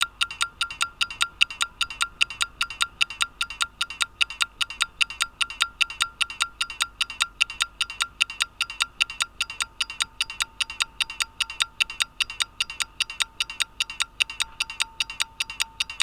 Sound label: Tick Tach Mic Test, watch; Accurist; tick tach timegrapher mic for watch enthusiasts